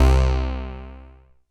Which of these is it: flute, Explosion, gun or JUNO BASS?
JUNO BASS